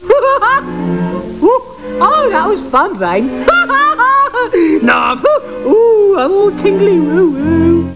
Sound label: tingly